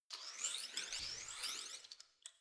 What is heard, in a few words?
organism short